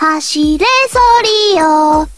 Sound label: padoru song; chatsounds